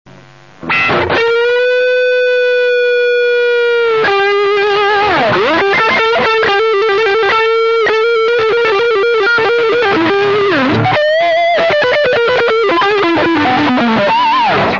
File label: jazz